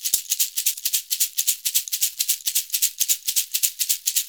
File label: SHAKER, Modern Percussion Loops; Soundscan vol.56; AKAI